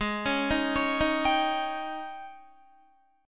Ab Major 7th Suspended 4th Ab Guitar 4F,4A,5C,5G,5C,6E; arpeggiated; AbM7sus4; 15,16,15,18,15,15; JGuitar